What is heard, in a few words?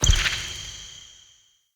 Thorn Bow Bird Arrow Impact Default; Weapon Impacts; Bows; Envoy Fey Bow